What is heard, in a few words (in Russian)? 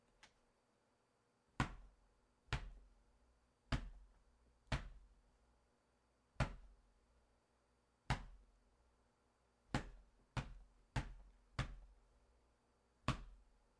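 ударный шум с использованием моей кровати и биты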